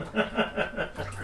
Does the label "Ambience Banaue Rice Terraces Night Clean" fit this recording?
No